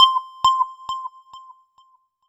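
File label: C4, Roland JV 2080, analog sequence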